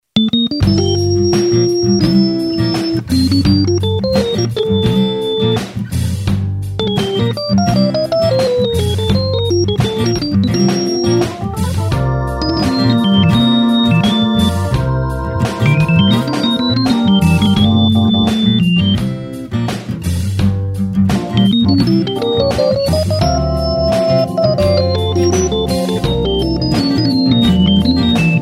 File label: Style Blues In G 85 Solo Keys; Blues Solos